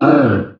Turtle pain

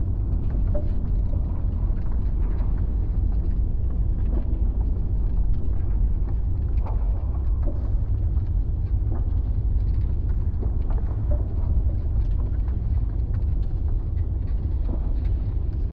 Ambience gangway; adams venture origins

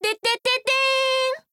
Humming - Item Catch; Hyrule Warriors Definitive Edition; Linkle's Humming

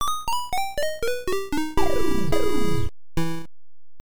Zapped; Kai no Bouken The Quest of Ki; NES

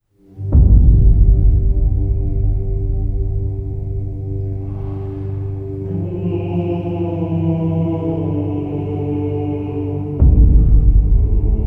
Chamber Choir; A Plague Tale: Requiem; Estonian Philharmonic Chamber Choir

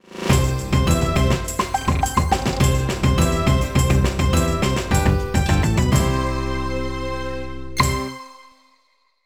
Sting; active health with carol vorderman; nds

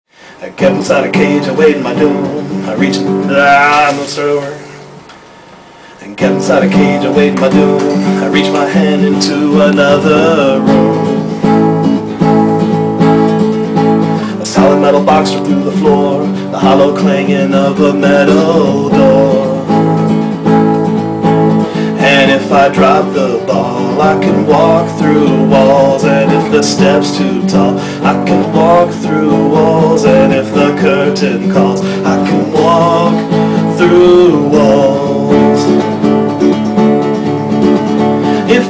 (Acoustic Version); Narbacular Drop OST

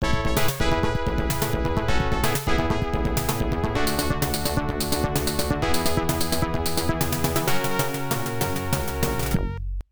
Round Over; Blaster Burn Budruga Episode III; msx2; msx audio

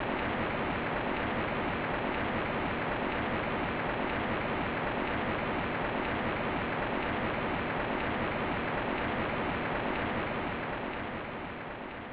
Falling Rain; Clock Tower The First Fear

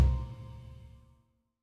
Loop Tail; Good Job

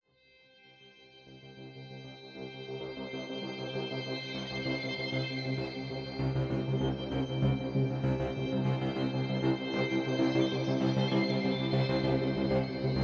Action Drone clusters

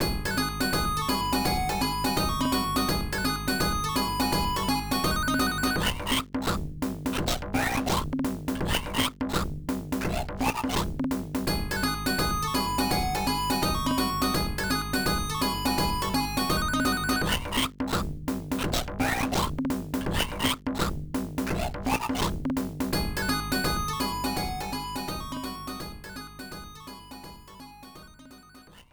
Bonus Game; Gouketsuji Ichizoku; Power Instinct; Genesis; Melody of the Intimate Kick

